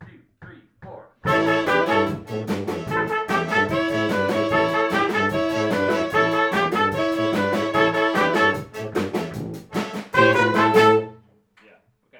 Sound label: wedding Beat